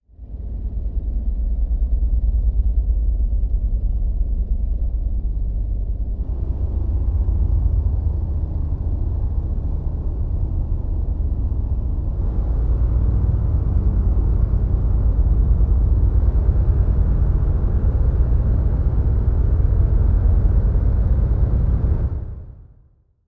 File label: Low hum revealing